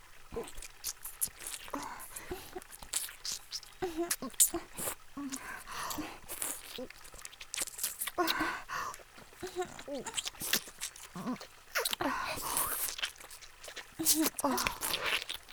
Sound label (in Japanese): 追加用フェラループ; 効果音有り